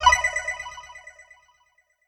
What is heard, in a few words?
gem spent